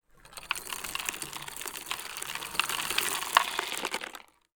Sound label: Sand Pebbles; TOOLKIT vol.2